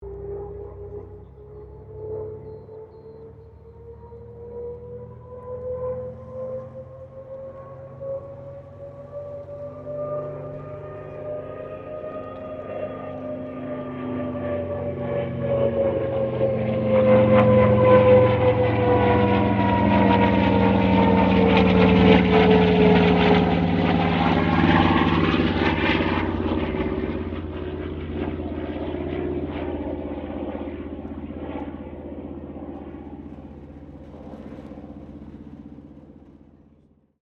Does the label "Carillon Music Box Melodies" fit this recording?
No